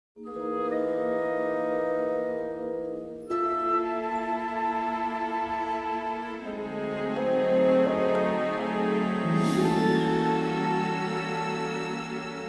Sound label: Orchestra Intro